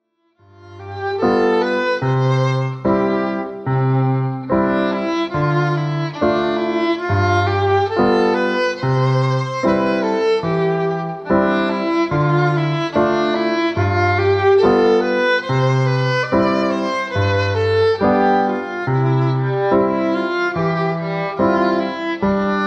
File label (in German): hoerbeispiel; wiegende wellen